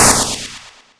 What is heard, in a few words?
missile; 3DShooting